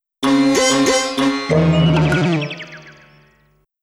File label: Sukkoke Jingle; Dancing Blade Katte ni Momotenshi